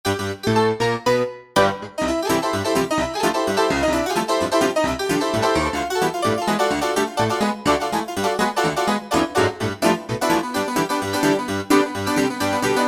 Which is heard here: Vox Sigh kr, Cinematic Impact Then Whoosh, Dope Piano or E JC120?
Dope Piano